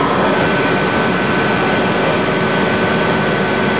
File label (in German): RAUSCH